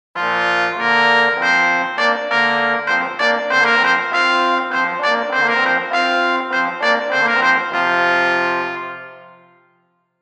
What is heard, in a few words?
Fanfare; Water Music